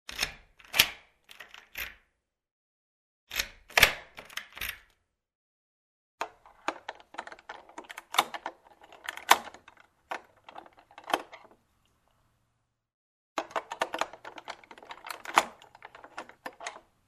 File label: Put the key in the lock, open, close the door